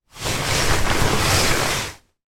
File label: household movements on bed with mattress protector on